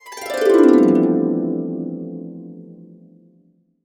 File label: C MAJ, Harps; USB Soundscan vol.62; AKAI